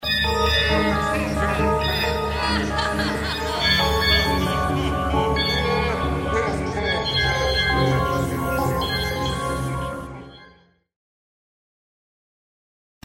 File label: game over, Chiptune; Sonic CD